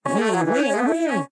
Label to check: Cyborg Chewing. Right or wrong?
Right